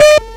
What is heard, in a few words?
laser warning, heli; wac